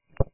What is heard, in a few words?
sweep 1s 500Hz 3kHz; Hilbert; Mic2 driver; CESI; LaSpezia